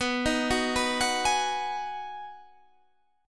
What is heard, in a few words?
B 6th B, guitar chord